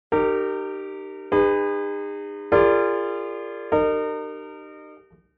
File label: 17 cadences, 17-ET